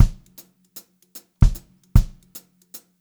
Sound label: Brushed Drum Loops